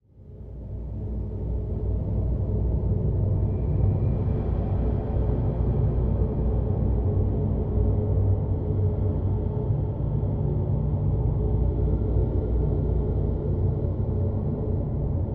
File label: cave deep, ambient underground